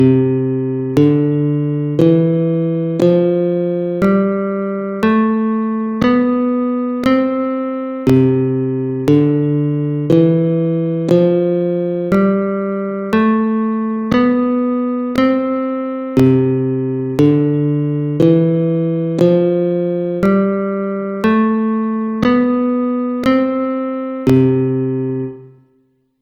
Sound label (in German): C Dur Tonleitern fuer Gitarre